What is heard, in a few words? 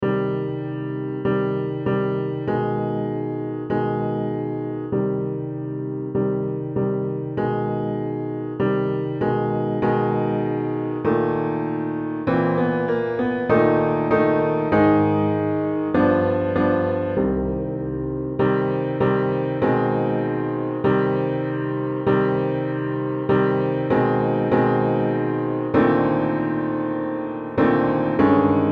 piano sonata